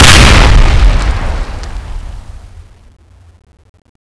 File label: explode; cso; thunderstorm